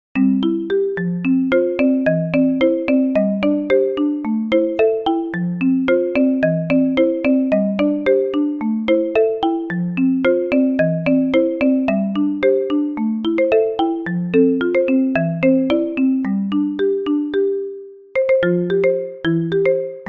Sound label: ringtone